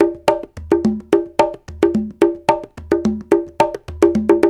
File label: 108 Soukous, African Contemporary; Spectrasonics; Supreme Beats